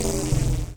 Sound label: Dance Mega Synth; Best Service; 90 s Sample CDs